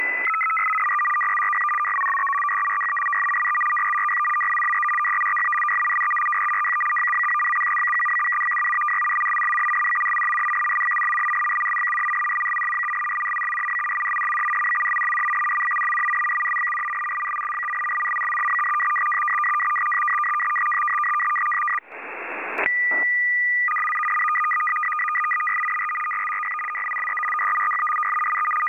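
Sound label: frequency-shift keying 100-740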